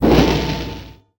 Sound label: Pickup Magic; SFX Kit; Pickups